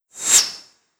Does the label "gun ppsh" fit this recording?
No